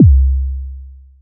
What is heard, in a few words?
shield hit; Player; Apotheorasis